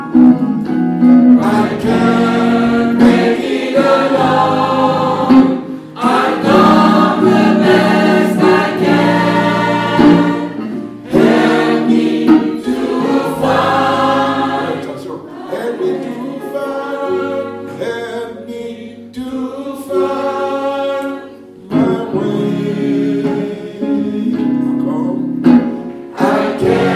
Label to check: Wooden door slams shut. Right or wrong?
Wrong